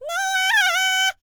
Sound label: BLACK SEA, World Voices; ILIO; Vocal Planet VOL-5